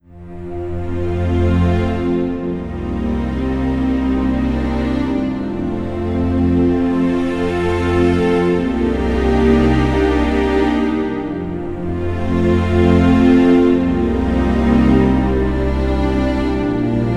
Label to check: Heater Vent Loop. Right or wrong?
Wrong